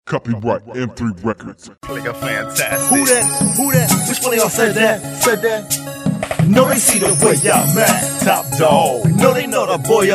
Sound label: funk samp